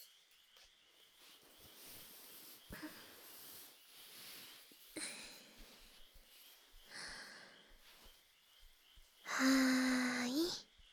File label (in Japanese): 左耳 耳かき, ASMR; いっしょにタッチケア